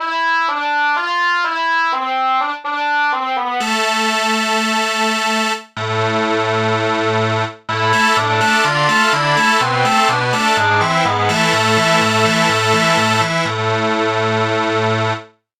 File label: bagpipe